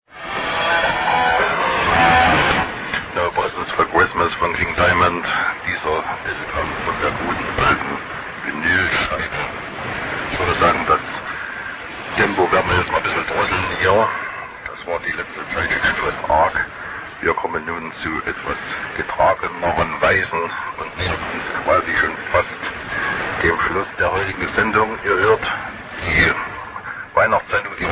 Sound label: 6301,7 kHz